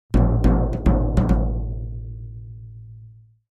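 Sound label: Drums Toms C Low Accent, drums tom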